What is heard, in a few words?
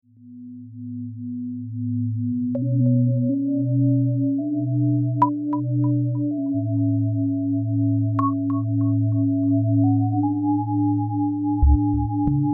more from bebot